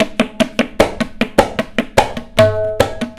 TABLAS; USB Soundscan vol.45 - Indian Traditions; AKAI

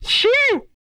SHEEWWW, Vocal Planet, HIP-HOP; ILIO

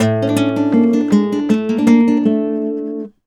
Flamenco, Guitar, a

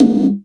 TOM GATE

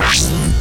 LASERMGF; Best Service; Advanced Mega Effects

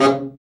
BRA BARI, Bariton Sax

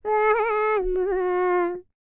PERSIAN, World Voices; ILIO; Vocal Planet VOL-5

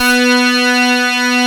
OB KNIFE B3, OB Synths; E-MU Formula 4000 Series Vol. 3; Analog Odyssey

